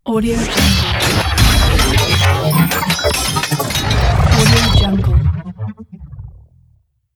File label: transforming